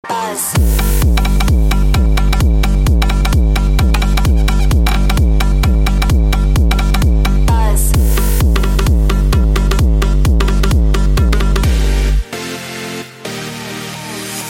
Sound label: techno